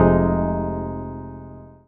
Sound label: stab rhodes, stabs